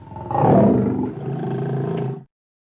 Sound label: Lion Fidgt Vox; Civ4 warlords